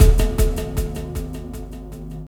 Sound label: Dub Drum; Sonic Foundry (Sony Creative Software); Ambient Grooves; Dub Hits